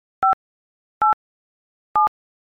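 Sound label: iphone keyboard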